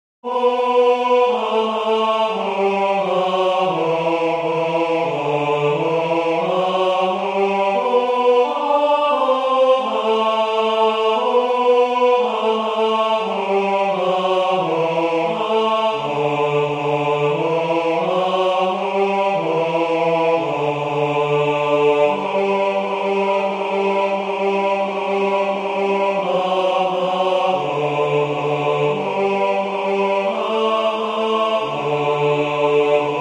Jesu Lamb of God Tenor; E.Elgar

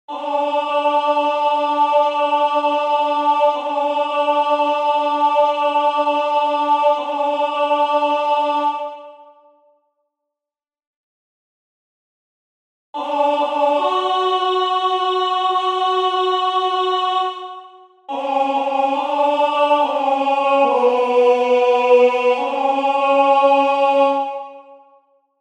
Tenor; The King shall Rejoice

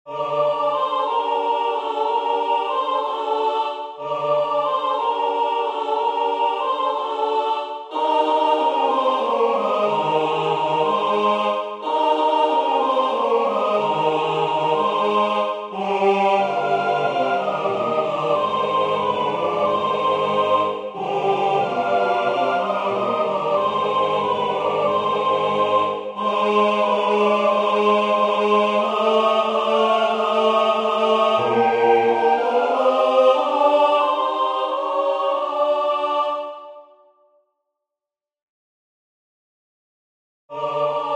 Holub na ja vore Sopr; A.Dvorak

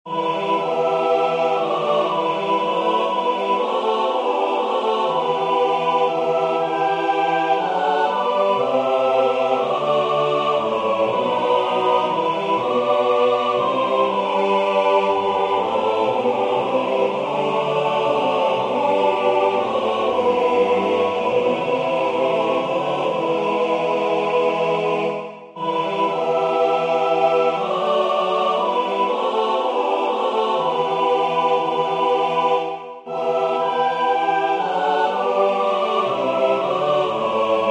Tutti; Sweet day

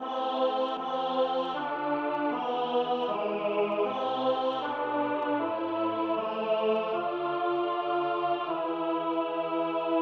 Tenor; C.H.Graun; Der Tod Jesu

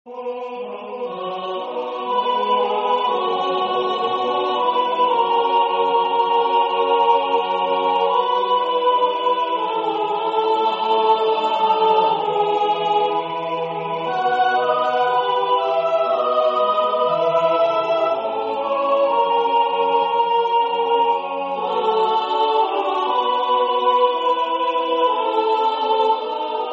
Nonne Sopr.; H.Schutz

